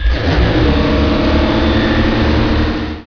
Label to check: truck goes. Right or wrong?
Right